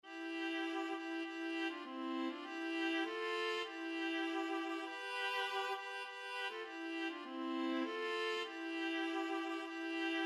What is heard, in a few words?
VLA, viola duet; at calvary